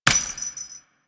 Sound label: Step Default, footsteps; Warframe; Grineer Royal Jester; Foley